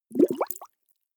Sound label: bubbles medium